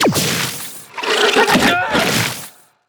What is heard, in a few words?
portal out; sfx